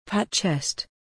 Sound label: pat chest; body percussion